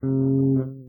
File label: bass; fofix